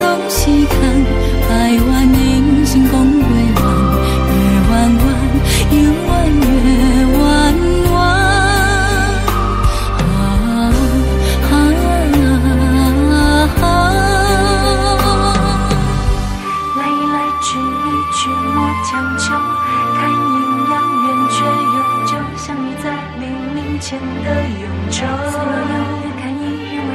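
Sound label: taiwanese music ringtone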